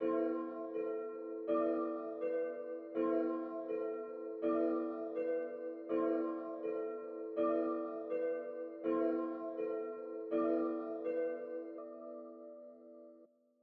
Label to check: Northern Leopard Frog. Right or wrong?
Wrong